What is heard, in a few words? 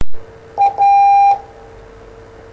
Morse A